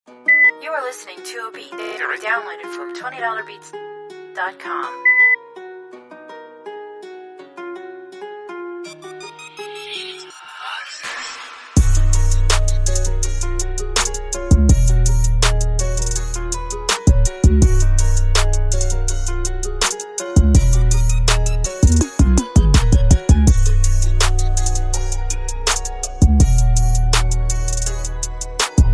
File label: Chasing Waves, Trap beats